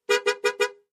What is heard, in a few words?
Aston Montego Horn Bl; aston montego ext. horns